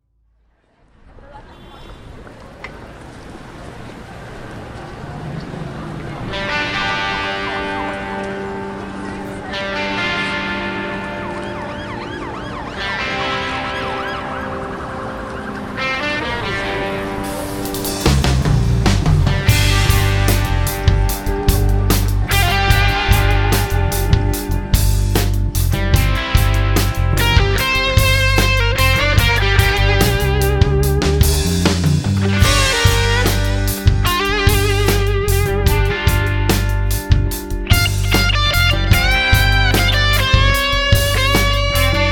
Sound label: RETRO-SONIC BLUES TRAIN